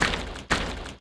MushRoom Walk; Seer; GamigoNA

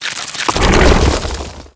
King Crab Regen

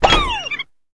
Mimic Stand; Seer; GamigoNA

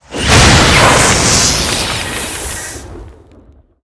Cross Drop; Seer; GamigoNA

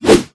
Yeti Attack

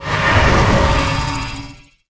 Divine Shield; Gamigo

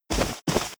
Run

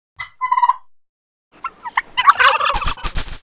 Turkey Idle

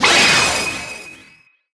Light Shield; Seer; GamigoNA

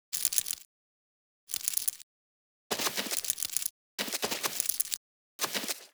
Spider Idle

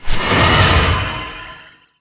Fury Impact; Seer; GamigoNA

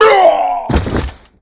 Die; ArkArch; Gamigo

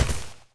Rhinoce Hunter walk; Seer; GamigoNA

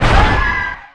Elf Sage Skill

